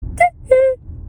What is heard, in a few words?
teehee